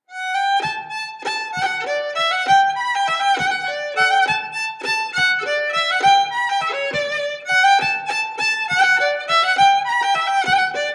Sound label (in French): Montagnarde de la montagne en general; Recueil Hainl 2